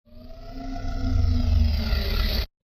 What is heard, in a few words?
Machine Charge Down; SoundsCrate